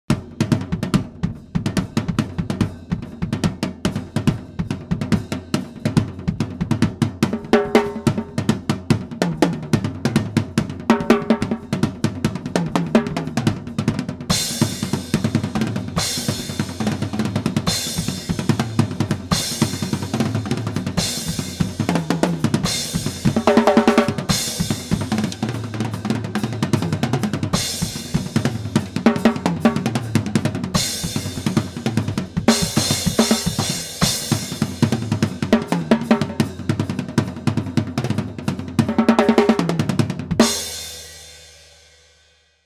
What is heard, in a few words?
drum play music; gamerip; The Sims 2